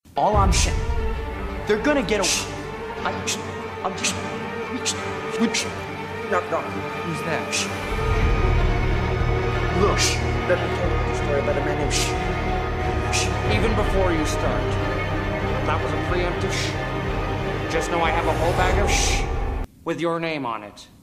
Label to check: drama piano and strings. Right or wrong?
Wrong